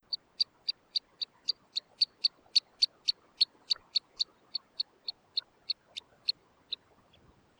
Caminera, Geositta cunicularia

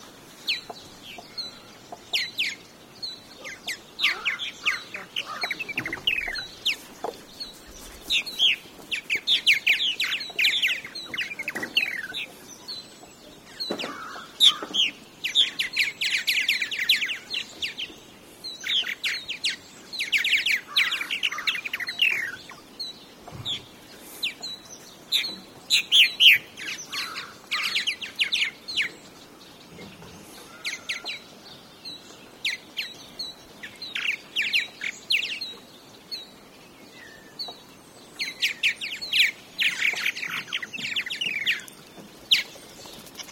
Golondrina Purpurea Progne subis

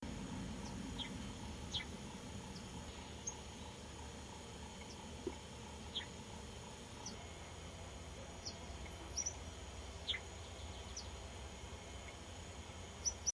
tordo pico corto

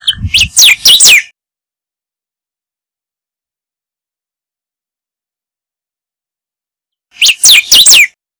rey del bosque

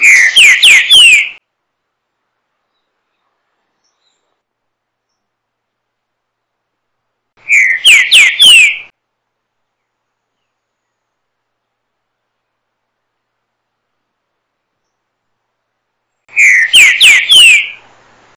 rey del bosque verdoso

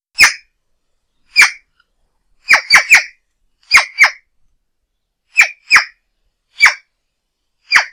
urraca

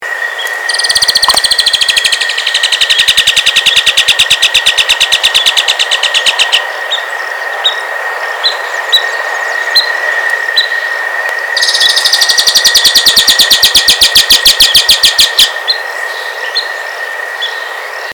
Chloroceryle amazona - Martín pescador mediano

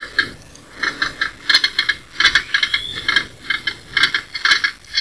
Carancho

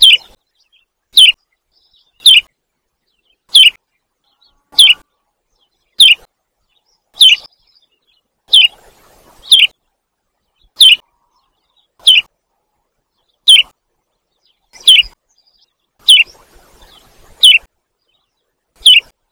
mosqueta estriada; Myiophobus fasciatus

